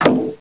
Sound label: drawer close